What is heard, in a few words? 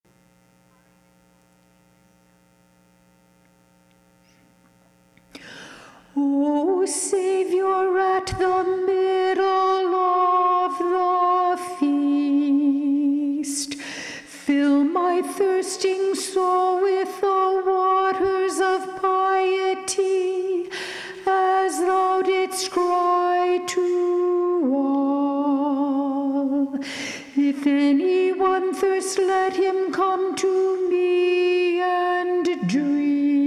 alto, choir; troparion mid pentecost